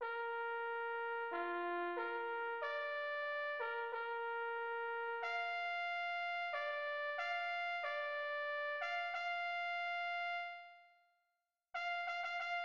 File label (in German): Ehrenfanfare